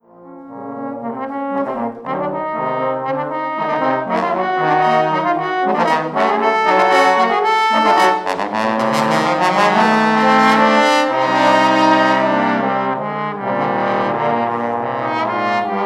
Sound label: quartet Sample